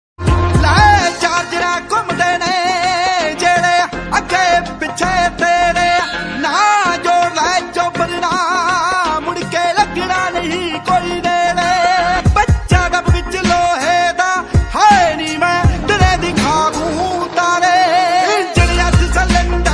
Popular Punjabi Ringtone

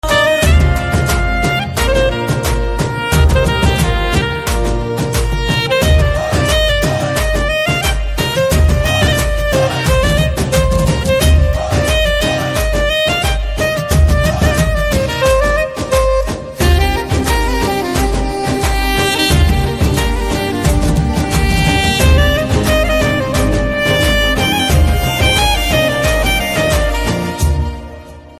BGM Telugu Ringtone

